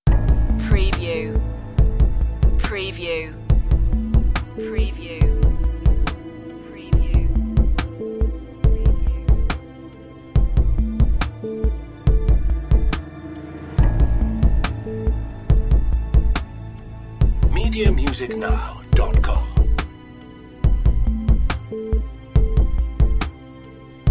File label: tension, nerves; future tension